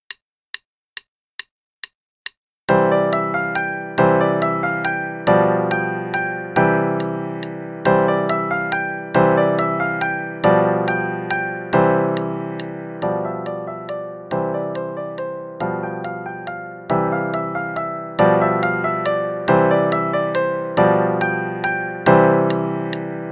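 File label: Ice Spinners Waltz (quarter note=140); Level 2B - 2nd Ed; Faber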